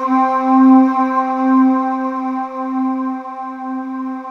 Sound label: DEEP BREATH, ROLAND JD-800